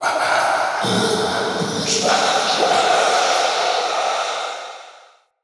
Male Vocal Death, Enemy; Mockery; Mockeries

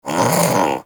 Armadillo Vocal Alerted

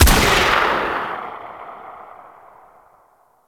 Sniper Rifle; Wraith; Grineer; Female; Weapons

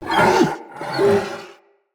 Corrupted Battle Dog Stun Idle, Animals, Vocals

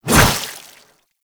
Hover Whizzby Sorrow; Pax Duviricus; Warframes

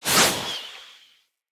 Bow Arrow By Charged; Weapons; Josalfar Bow

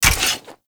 Staff Equip; Warframe

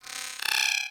Beep Comby; Warframe; Corpus

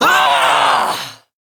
Throw, Barks; Kuva Lich; Persona C; Ability

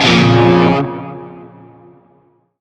Shawzin Hirajoshi Chord; Warframe; Narmer Shawzin

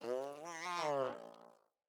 Kitten Yawn; Enemies; Catbrow

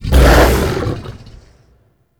Axe Weapon Wind Up; Warframe; Weapons; Tenno; Wolf Frame

